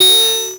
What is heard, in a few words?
Multimedia Internet CD-Rom Flash Buttons Short